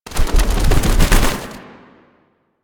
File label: Bird Three Wing Flap; Warframe; Ambience; Entrati